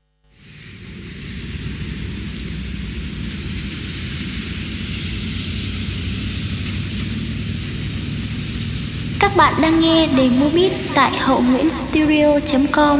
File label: beat, playback